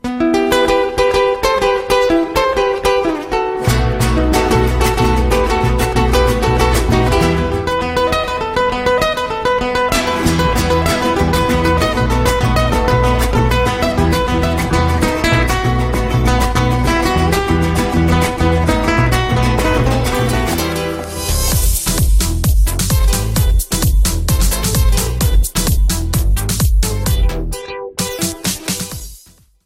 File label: guitar mix